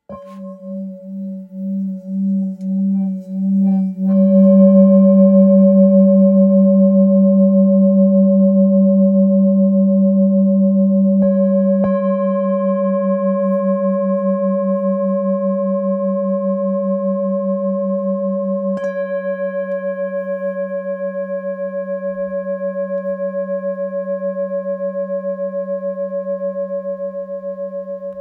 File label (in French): Full moon fa diese